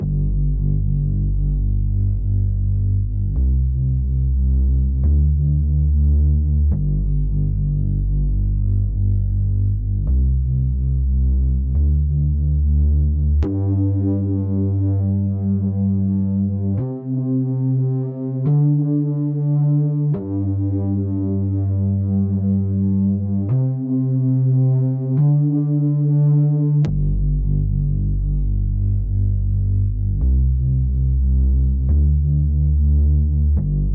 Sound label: 143 BPM G Min Bass; Cymatics; Rise Up; Destiny Gold Expansion